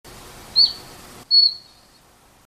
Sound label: sweeoov tristis